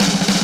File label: Snare short fill